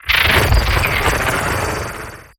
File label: Warp Blast Teleport; Evolve; Wraith